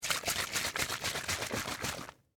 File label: Mousse Can Shake, Household, Mousse, Can, Shake; Spraying; Sound Effects:Objects; BlastWave